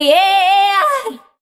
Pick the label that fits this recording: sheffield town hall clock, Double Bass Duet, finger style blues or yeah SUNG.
yeah SUNG